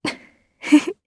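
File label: Laugh, Japanese; heroes; Tanya; kingsraid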